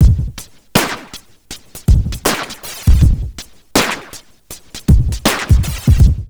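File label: neuro, drum loops crazy processed, 80 bpm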